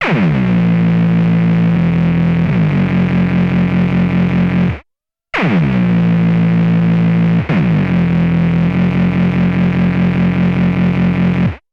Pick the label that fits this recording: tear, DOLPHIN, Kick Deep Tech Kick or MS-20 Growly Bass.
MS-20 Growly Bass